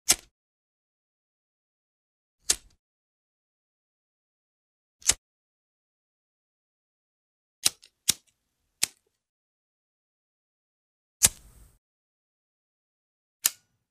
lighter

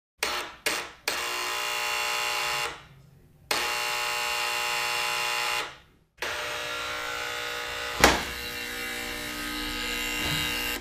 electric door bell

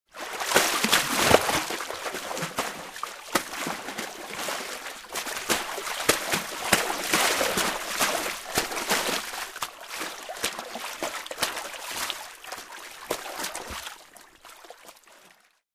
Splashing in the water

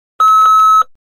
Digital audio signal; radio signals

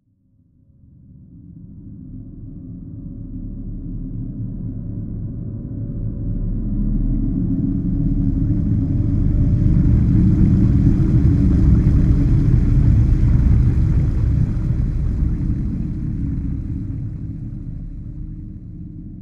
Submarine sails underwater

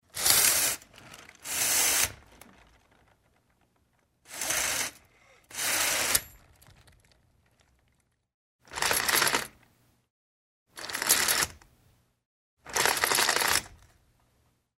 Vertical blinds; windows

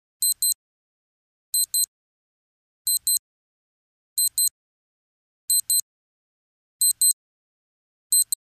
Alarm clock on a wristwatch; watches

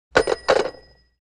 Hang up the phone; telephone

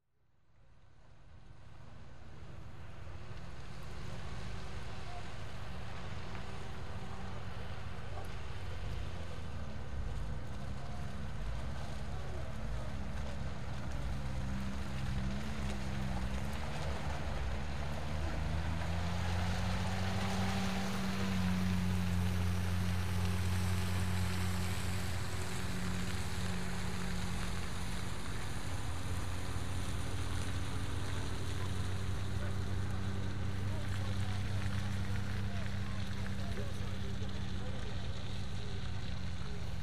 The tank drives by, stops, the soldiers talk

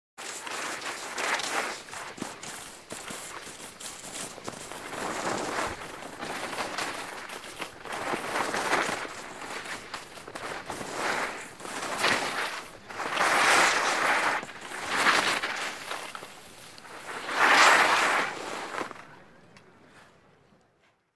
Skiing in the snow

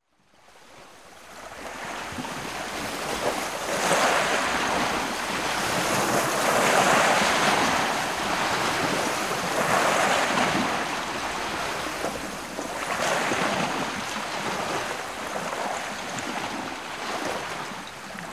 Waves

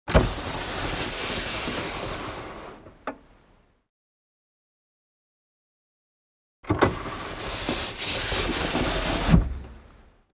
Car glass lowered, raised manually

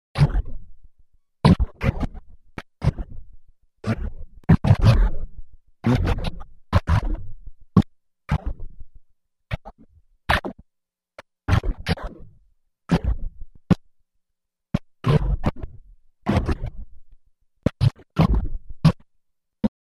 space alien radio signal; radio signals

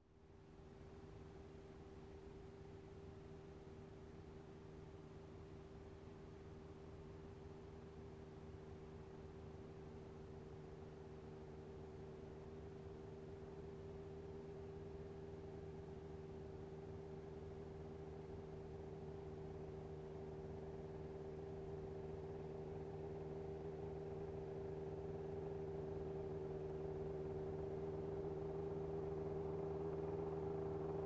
Motorcycle approaching, turning off

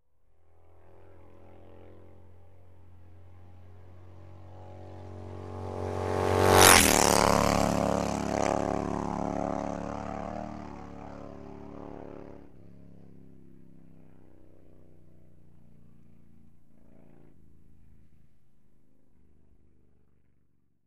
Motorcycle passes by quickly

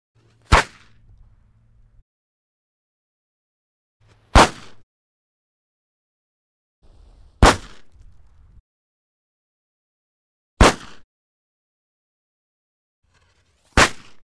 Firecracker explosion; explosions

